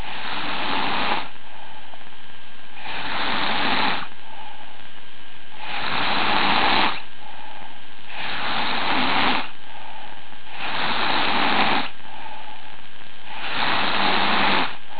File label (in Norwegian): snorker; BBQ Party